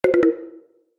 android 4.4 low battery

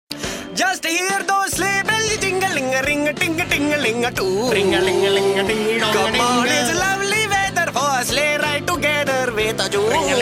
indian christmas song

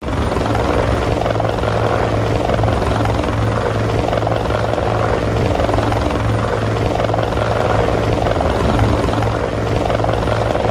helicopter

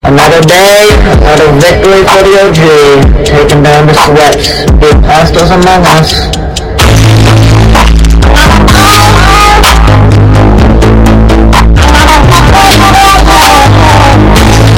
bass boosted

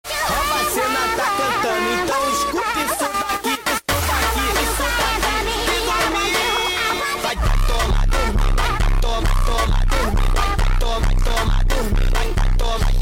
phonk; ironmouse

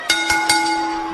ring bell; wwe

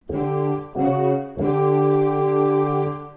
dramatic dun dun dunnnn

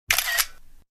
iphone camera shutter

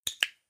dog clicker

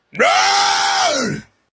raaaah

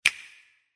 nintendo switch click

